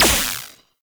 Laser Skin Printer Laser Start; Warframe; Ambience; Entrati

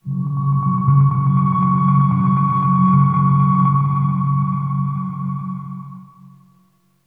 Space Random; Warframe; Hub; Liset Space Randoms

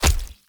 Raw Meat Impact; Gameplay; Awakening Remaster

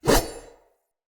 Saw Dagger Whoosh, Weapons Swords; Player

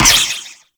Ricochet; Warframe; Cowgirl

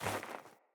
Sandals Walk Snow; Player; Footsteps

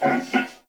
Wolf Breath; Animals; Duviri

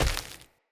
Walk Default, Footsteps; Friendly; Avakot

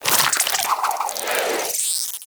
Nexifera Drop, Hunting Critters

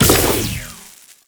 Tentacle Proj Die; Warframe; Proto Infested; Ancient; Abilities

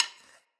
Hunter Bow Reload Arrow Knock; Weapons; Enemy; Bows

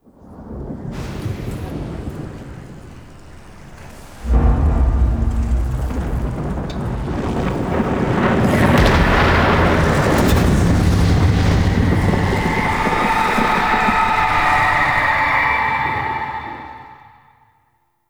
Diorama Scrapbook SFX; Warframe; Duviri

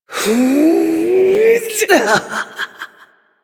Lift Laugh, Corpus Lich; Warframe; Persona C

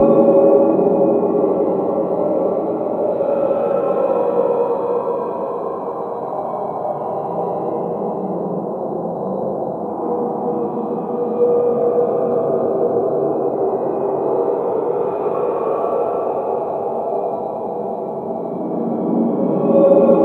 Infested Interior Amb; Warframe; Corpus

